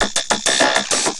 Vocobeat; Sonic Foundry (Sony Creative Software)

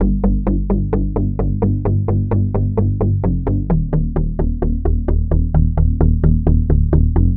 G Bass Knocker, 130; Zero-G; Future Wave

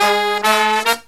Brass Riffs

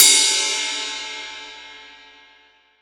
20 TIP, 20INCH SAB RIDE

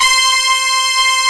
RICH BRASS; AKAI S6000 CD-ROM - Volume 1; BrassWind

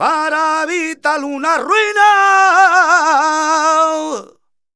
voc d#, Flamenco Vocals Male Cantaor; Best Service ProSamples vol.46

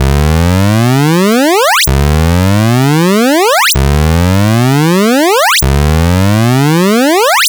Game Boy FX Alarm Loop, chiptune; Nintendo Game Boy

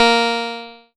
A#, PIANO; Big Fish Audio; Synclav FM

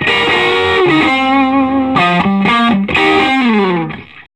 SOLO, Vintage Blues Guitar

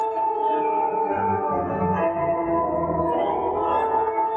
Tonal Re Draw, sparse soundscape

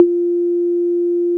analog conga, Yamaha TG-500

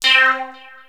Access Virus B; Synthworx

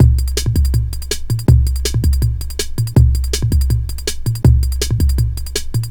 Digital, Loops, breaks; m8 breaks

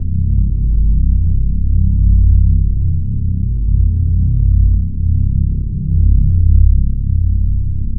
Drone Ambient; Drums & Drones

